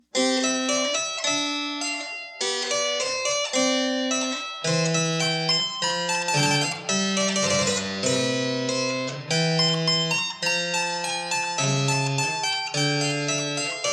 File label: Closing music, Allegretto; Christian Gottlob Neefe; Classical Refinement